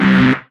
Dance Mega Synth; Best Service; 90 s Sample CDs

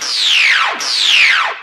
scifi, SCIFI & SWEEPS; Techno Trance; FX & ATMO; Techno Trance Essentials

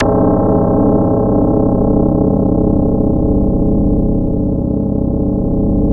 false wire, Twisted Textures

